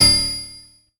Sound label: Stab